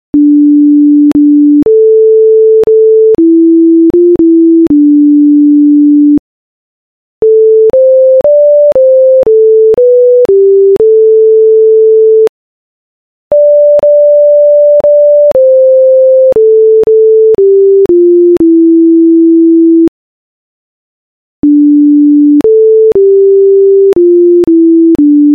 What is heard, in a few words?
Scarborough Fair